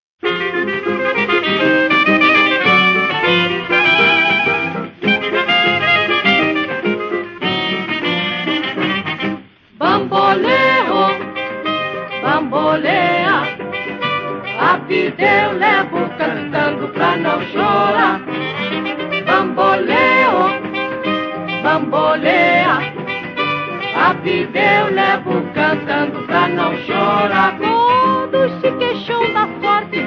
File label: Samba